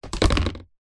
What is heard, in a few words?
tub plastic lid set down on tub; foley